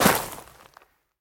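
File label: strong hit destroying a stone pillar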